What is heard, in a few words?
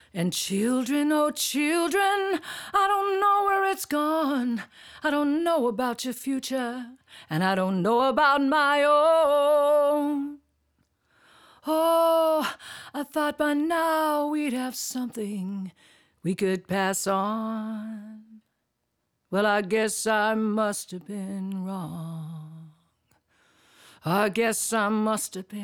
vocal; peacock